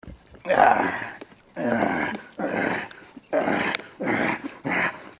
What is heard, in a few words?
evil aaggghhhhh